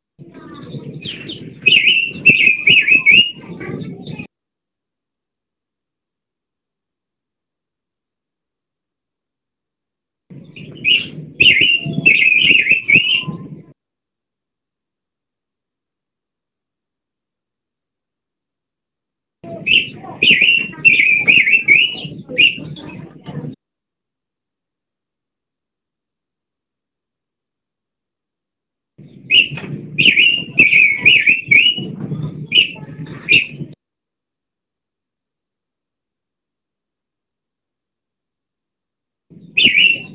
burung pancawarna

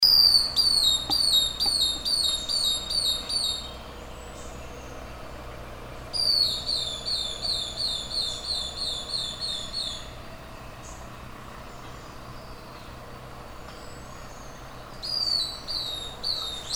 burung madi injab

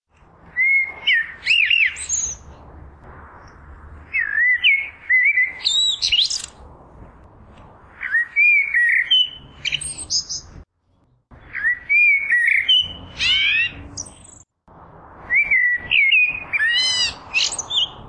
suara burung blackbirds

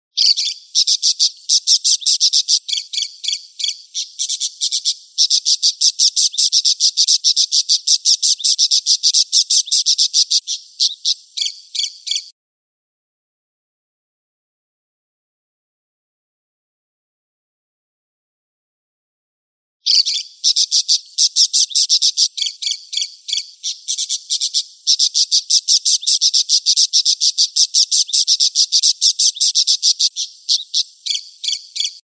suara burung parkit

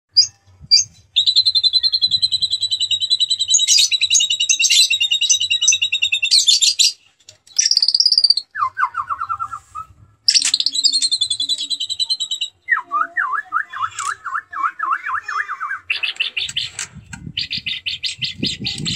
murai batu tembakan lovebird kenari

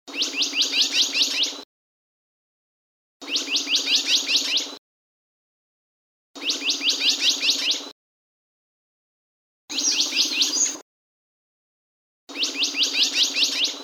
suara kicauan bondol rawa

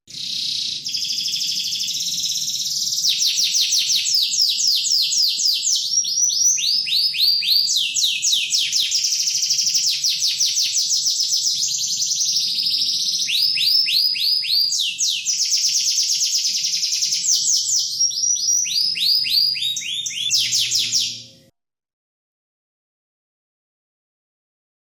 suara kicauan kenari standar

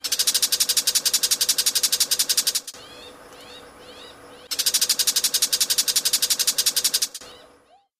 cendet coklat call

